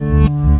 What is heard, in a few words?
REV HOOK, Synth FX Misc